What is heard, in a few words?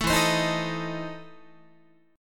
E Major 7th Suspended 4th Sharp 5th, Guitar